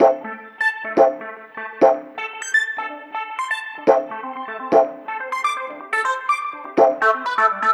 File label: Funktek Flowin; Sonic Foundry (Sony Creative Software)